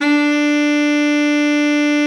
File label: BARITON, BARITON SAX